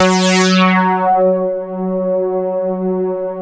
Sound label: LEADS; Masterbits; Vintage Voltage